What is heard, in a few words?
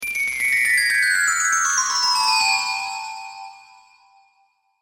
Chimes High To Low Slow With Reverb, Windchime